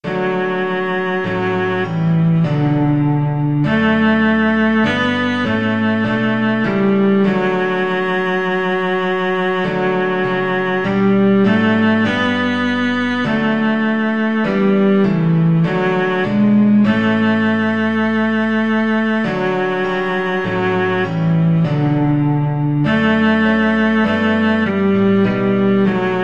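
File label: violoncello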